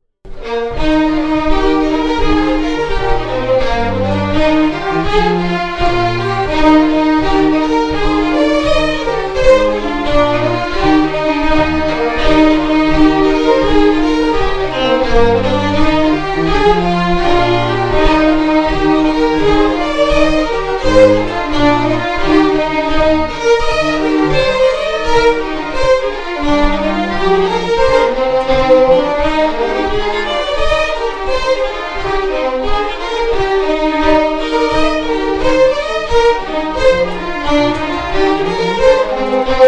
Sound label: Jigg; The Jink & Diddle School of Scottish Fiddling